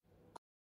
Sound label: sad instrumental ringtone, only music tone